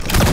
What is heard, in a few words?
Gun Gun